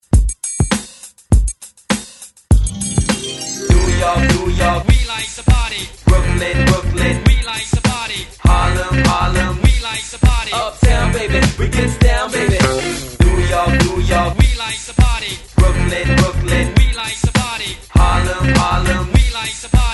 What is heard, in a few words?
Hype Remix 101 Bpm